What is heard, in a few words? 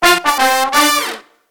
Disco Fever, BRASS-LICKS; Best Service ProSamples vol.48 - Disco Fever; AKAI